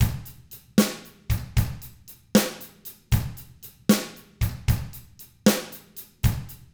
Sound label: Drums, R N B 6-8; ILIO; Double Platinum Drums 1